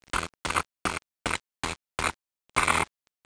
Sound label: buzz